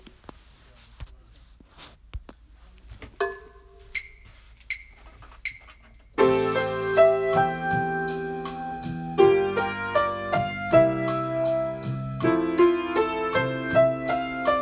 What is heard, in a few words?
chord line